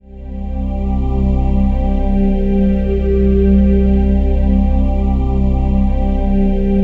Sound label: PHASE PAD, ATMO PADS; Best Service; XX Large